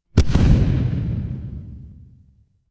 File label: impact explosion